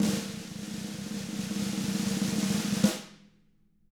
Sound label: TAMA, Drums; ILIO; Double Platinum Drums 1